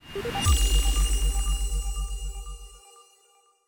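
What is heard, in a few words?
dinosaur level up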